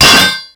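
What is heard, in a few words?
destruction hydrant; Explosions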